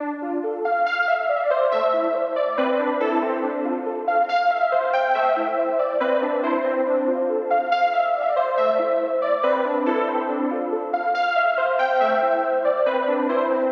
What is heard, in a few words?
140 BPM A Min Prophet 6 Keys; Stems; P6; Cymatics; Manifest